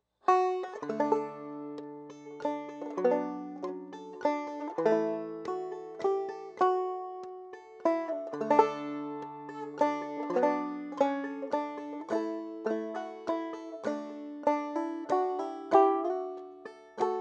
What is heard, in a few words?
slow; Christmas